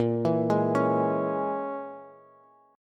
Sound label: Bb Minor 7th Flat 5th, Bass; Bbm7b5 arpeggiated